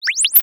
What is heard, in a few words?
maximize, Interface Sounds; Kenney's Sound Pack